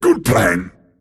move pain; pudge

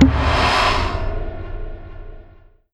healing salve; dota

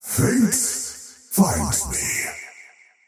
death; dota; faceless void

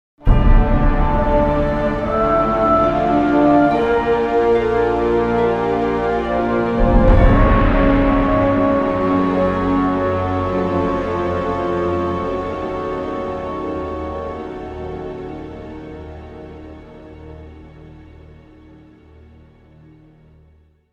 lose; radiant; valve dota 001; stingers

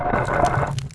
move, movement; ogre magi

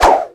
kobold whip; weapons; dota; creep; neutral

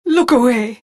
death; dota; phantom assassin

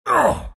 pain; beastmaster

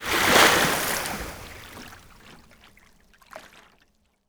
water splash medium; body; physics

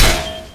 attack; tidehunter

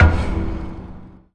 click alt, ui menu

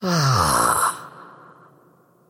wolf attack emote; dota; crystalmaiden

